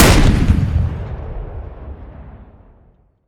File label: Weapons Cannon 73mm Gun; DCS